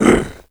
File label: troll hit; The Battle for Wesnoth